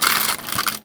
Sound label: Foley